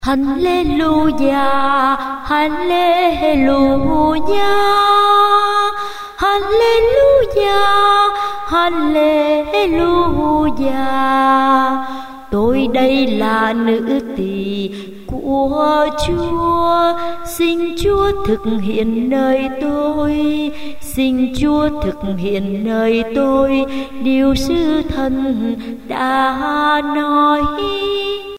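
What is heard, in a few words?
soprano tenor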